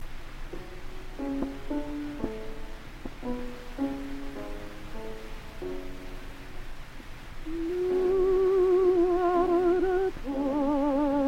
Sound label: Gramophone